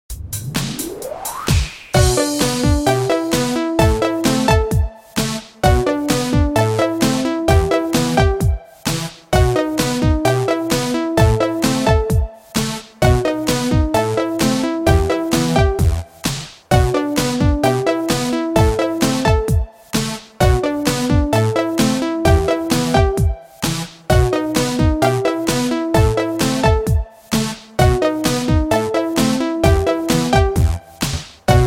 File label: Ballad Prep